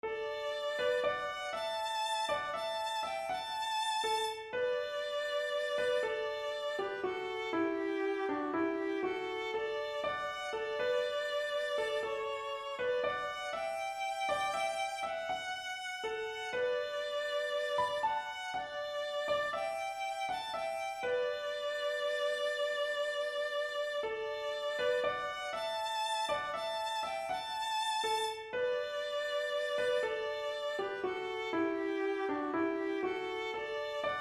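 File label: melody with 3rds 5ths; Green Island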